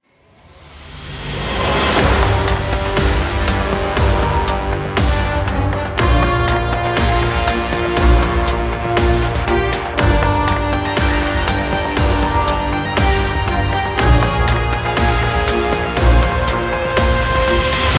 Battle Intro; Resonance Solstice

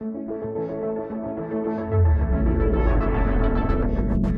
Load Generic; F1 2012

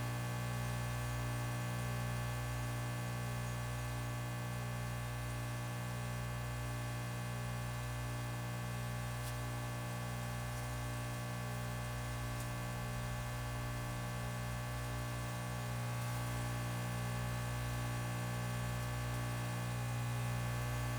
light machine

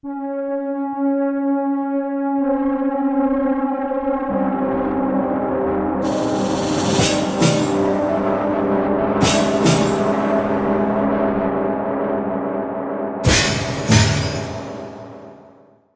Jingle; Adventure Player; psp

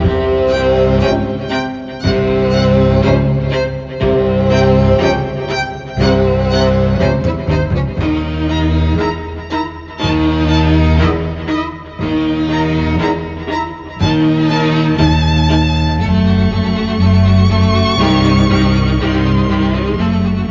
BGM battle

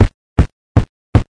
step; Misao

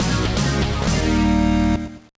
Boss Dead; Pinball of the Dead; GBA

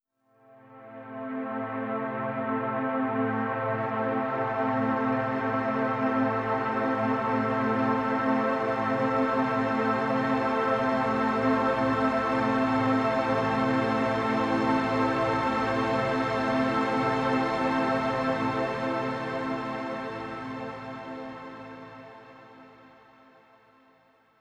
Overlay Drone

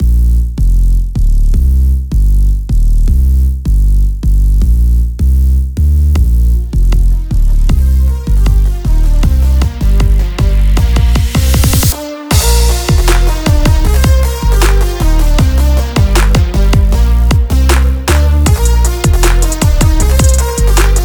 (Instrumental); friday night funkin vs. hecker ost pc mod gamerip

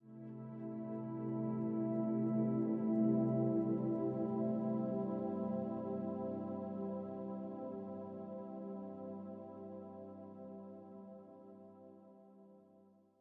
Soft

